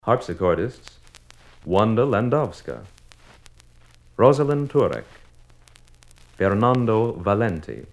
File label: Harpsichordists